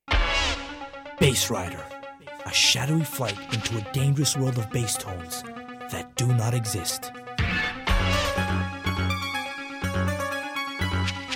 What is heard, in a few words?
bass tester sub blower; mtx